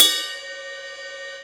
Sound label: Yamaha EX5, Drums